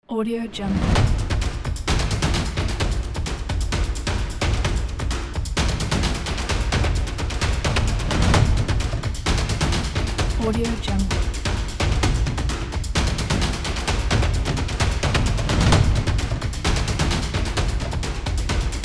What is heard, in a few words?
sport drums